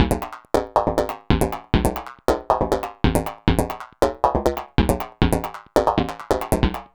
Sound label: Electronic Percussion